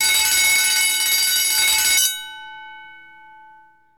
School Bell; Five Nights at Freddy's; Ultimate Custom Night

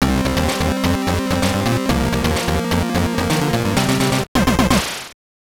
Unlocked; Supercross Freestyle 2000; GBC

